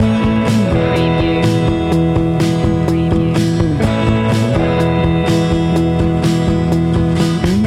rock with us loop; Rock / Folk-Rock

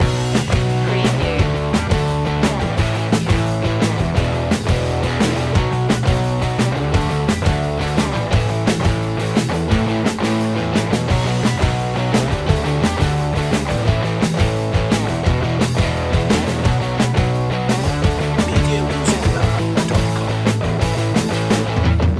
punk pop loop

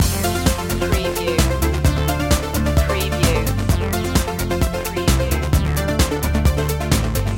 loop, chill

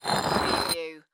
Brick Scrape Drag; sound-effects-bible

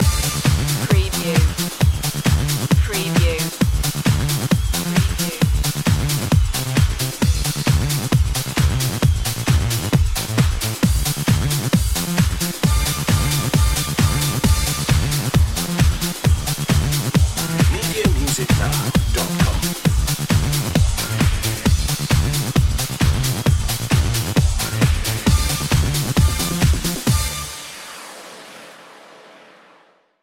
basshead

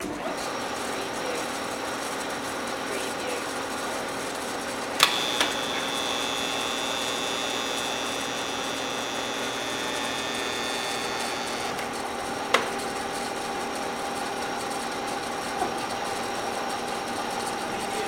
Radial Drill

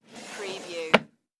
Drawer Close Wood; Sound Effects Bible

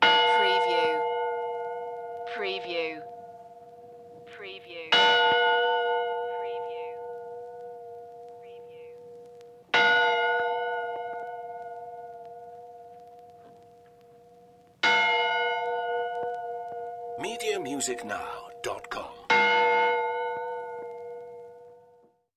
Bell Tolling Vintage

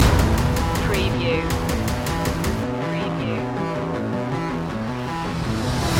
savage future loop

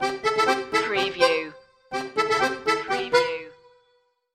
cajun stinger

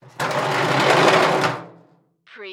Garbage Can Drag

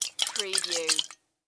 Aerosol Can Shake; sound effects bible

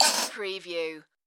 Tui Cackle; Bird sound effects including birds chirping and squawking

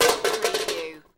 Metal Debris Impact Short Medium

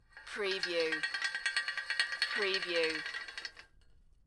Metal Chain Pulley - Rattling Chains

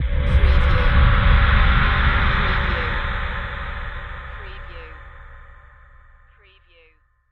Swell Hit

